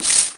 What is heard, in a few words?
shook